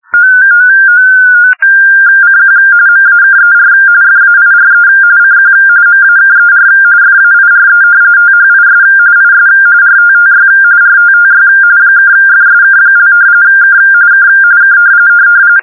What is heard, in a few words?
RTTY; modulation